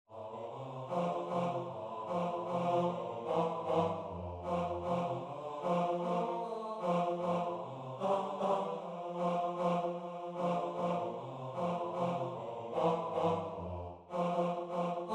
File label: bass